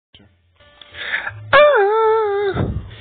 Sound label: aaaahhh